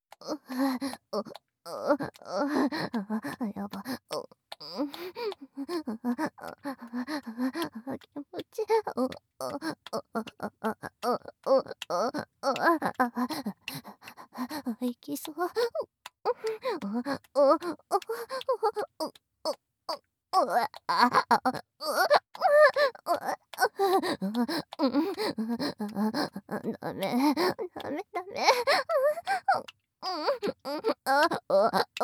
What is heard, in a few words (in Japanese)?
オホ声; SEあり